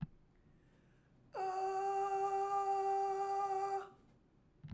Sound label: G4 head, Head Voice singing